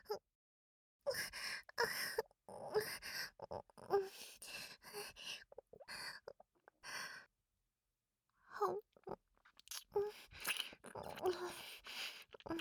べろちゅー, 右, 効果音 なし; べろちゅー、だして（右・効果音なし

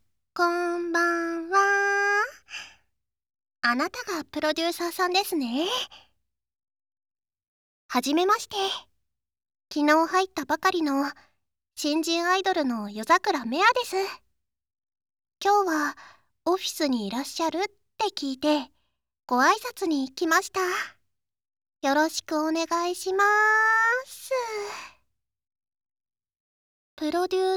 耳舐め; 残業中のプロデューサーさんに耳舐め

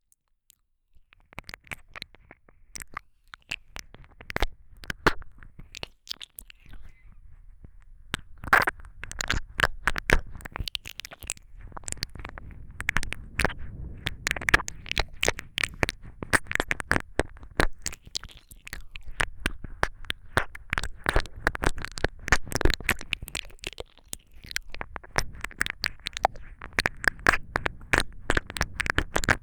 お耳に吸い付く耳舐め, ASMR

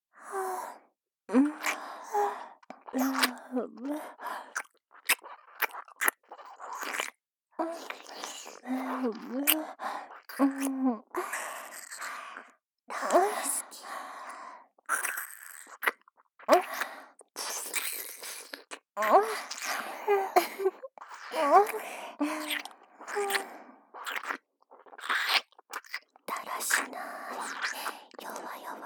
耳舐め囁き; 意地悪な後輩チアガール姉妹

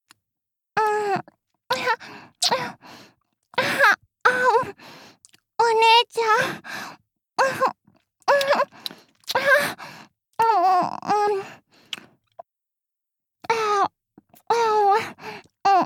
キスボイス; 長女

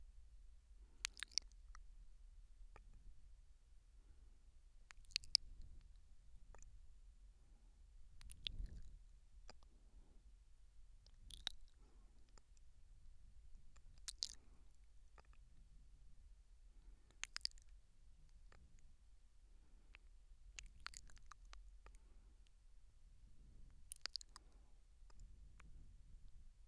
右耳甘噛み

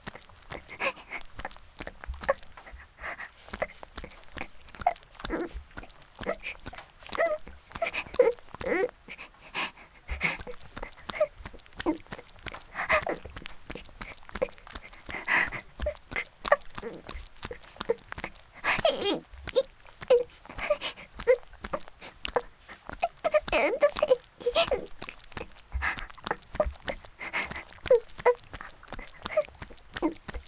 雨降りバージョン ギシアンループ; あ～にき！いくら出す?

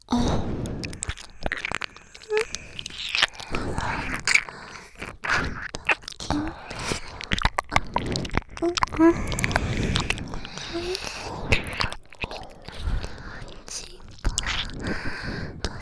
耳舐め喘ぎ; お屋敷メイドのハーレム淫語ヘヴン 気が済むまで耳舐め淫語を堪能できるバイノーラルオナサポ音声; 静華＋

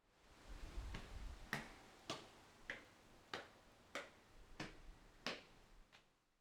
立体駐車場の階段(駐車場内), 足音; 足音系

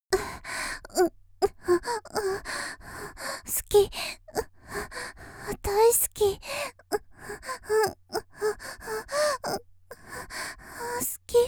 「喘ぎ」（好き、大好き）と言いながら; 30秒; 綾波系少女

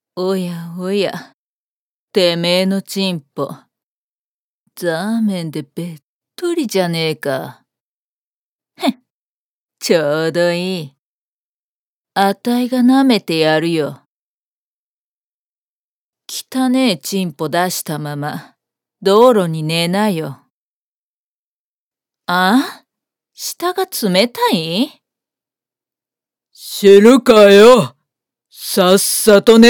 舐めてやんよ

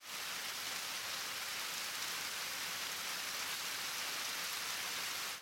雨音

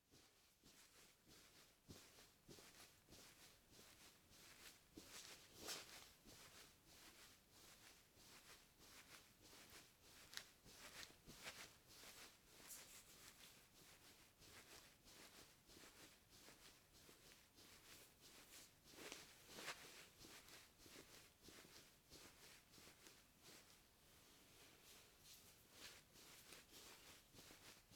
騎乗位中の挙動(上下に激しい); アダルト系

